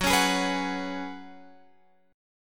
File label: Gb Suspended 2nd Suspended 4th, Guitar Open F; strummed